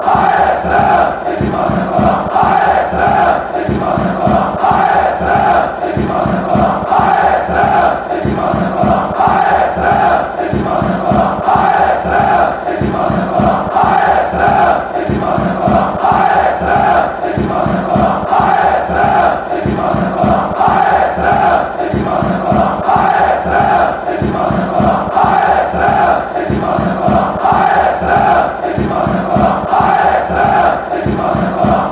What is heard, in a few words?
allez le, fanchants